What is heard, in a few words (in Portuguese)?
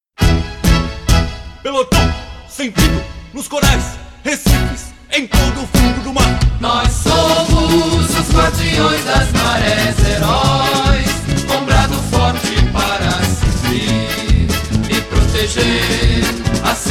Marcha Da Cavalaria remix; zoro o peixinho aventureiro